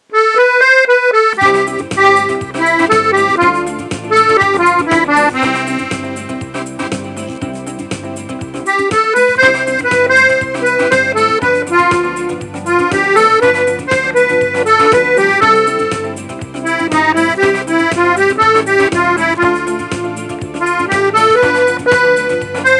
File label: samba